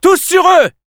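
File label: battle cry, French; Scout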